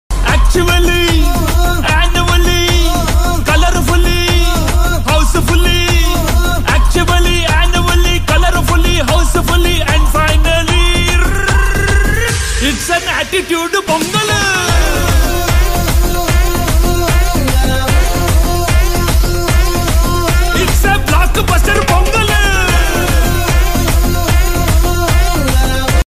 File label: Pongal Song Ringtone